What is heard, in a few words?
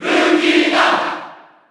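Cheer Japanese; Lucina; SSB4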